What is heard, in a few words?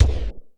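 processed kick, drums; Crypton - DrumBase5000; PROC.DRUMS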